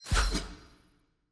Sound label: environment mob level up